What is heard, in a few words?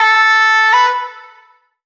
Vocal one shot A